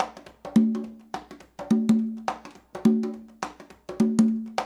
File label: LOOP, CONGAS, Percussion